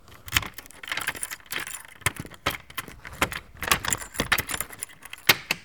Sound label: Wooden Gate Unlocking